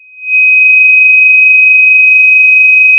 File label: Sound of a tea kettle whistling